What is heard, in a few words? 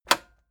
cassette eject release heavy